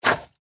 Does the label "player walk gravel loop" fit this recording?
Yes